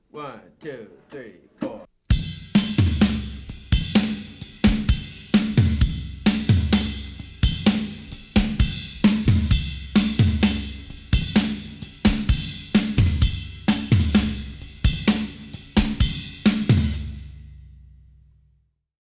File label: cha cha cha variation